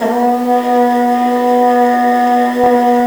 BRS TPMT, Harmon Amb; Roland L-CDX-03 Disk 2

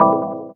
DR. LOOP; X-Static Goldmine 3; CHORDS

